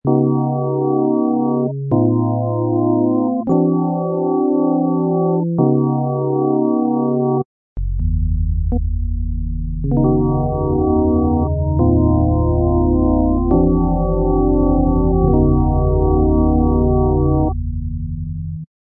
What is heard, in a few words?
cadence